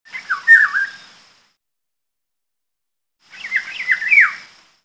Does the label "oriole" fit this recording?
Yes